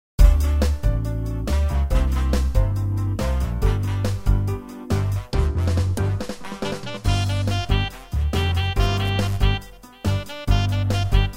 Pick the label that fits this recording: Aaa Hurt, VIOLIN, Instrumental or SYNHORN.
Instrumental